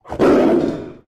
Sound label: tiger